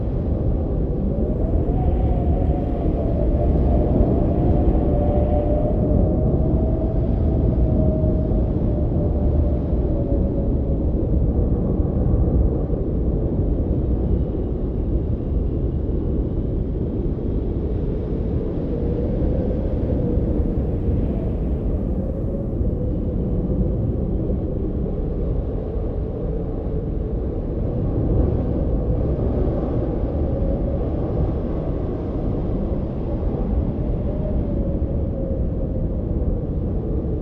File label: Wind mine